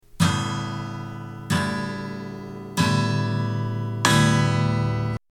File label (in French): Guitare